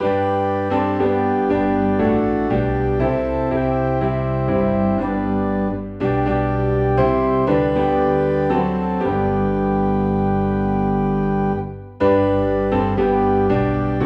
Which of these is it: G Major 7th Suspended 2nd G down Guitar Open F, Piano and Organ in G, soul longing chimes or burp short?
Piano and Organ in G